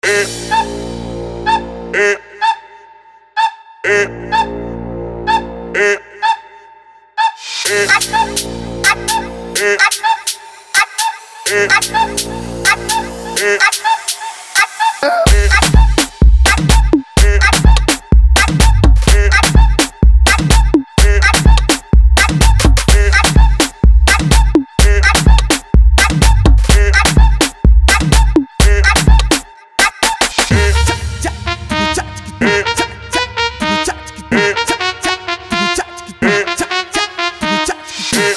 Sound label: trumpeta; cut clean